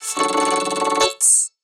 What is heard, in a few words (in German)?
Frrrrritz, fritzbox ringtone